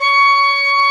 ORGN, CHURCH ORGAN; Roland - LA Composer vol. 1

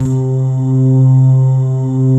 SOFT PLUCK RS, pads; Pro-Rec - Synthsonic

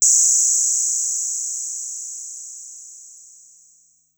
Noise High, Korg Wavestation-SR, Drum Kit; Wave 455 - Drum Kit

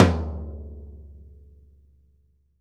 P. F TOM F; VELOCITY TOM 2

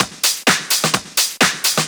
Straight Loop 128 BPM, House

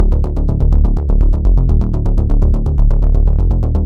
Phase Plant, bass

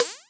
Percussions

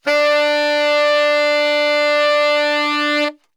D 2 SAX STR, Brass; Best Service; Brass Super Section

